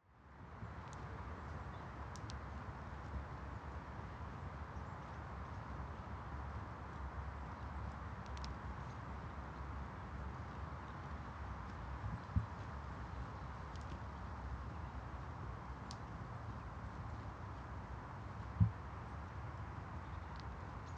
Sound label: outside ambience. next to the horses